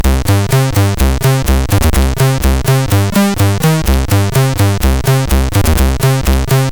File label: Rap Mix; MOS6581R3; hvsc